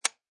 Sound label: Shotgun Dry Fire, Dry fire / misfire click; Gun Handling Sound Effects; Guns